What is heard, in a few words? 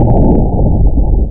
smash LOW; r3 the art of rocketry